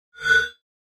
Mouse Over Elect, mouse over

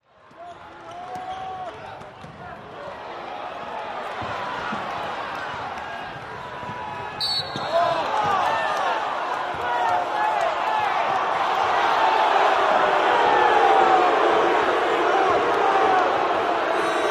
gym crowd boo, boos

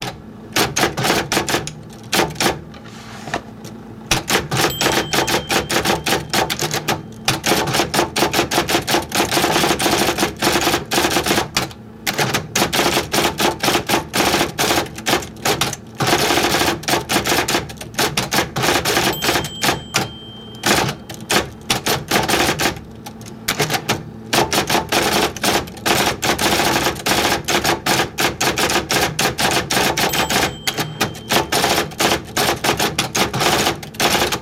Typing On IBM Electric With Bells Loop

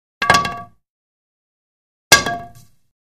Billiards Stick Dro

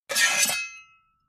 Spatula Long Scrape, kitchens; also good for drawing sword

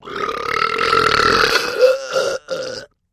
Extra Lrg Bubbly, belching

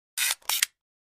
Flash Gear High Pitch, flash gear

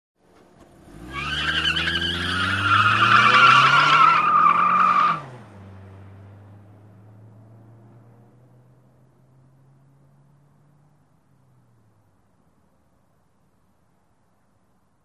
Sedan Accel Tire Sql

